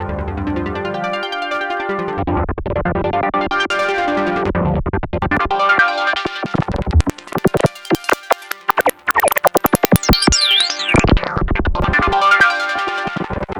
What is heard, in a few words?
Arp Stereo Filter Phasing